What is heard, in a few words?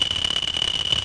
spider; vehicles